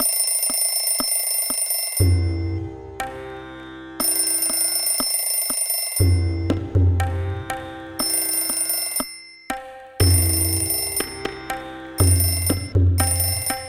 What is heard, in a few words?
Alarm Tabla Jam; Samsung Z2; Ringtones